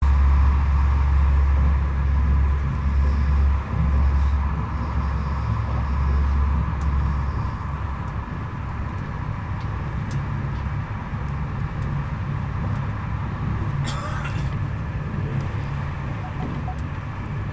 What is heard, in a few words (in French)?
bruits du train; vers forumorleans